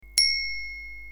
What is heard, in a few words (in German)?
Fahrradklingel; Eddy